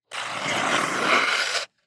ICE Skate scrape one leg drag

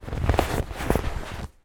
toy bop bag inflated handling creaks squeaks

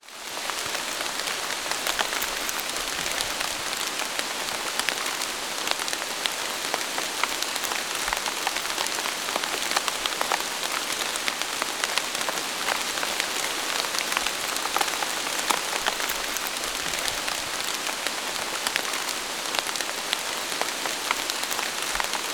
nature rain forest drips ground

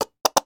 food mayonnaise bottle empty squirt; sound effects one

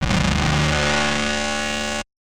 Electro Troll; martyn stonehouse

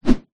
warfare battle axe swing

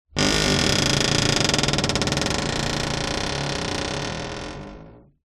household door creak heavy large antique

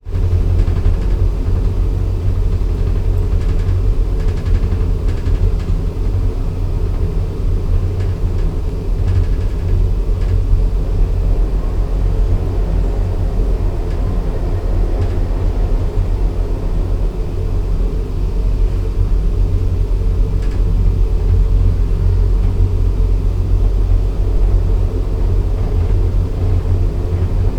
transport boat ship ferry onboard toilet ambience

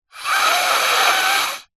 human snort nose through straw

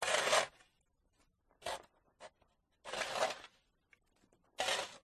shovel clearing snow from path sequence; toolmisc; field reports

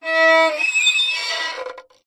musical violin bowed scrape badly

